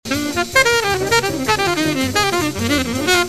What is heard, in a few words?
line fast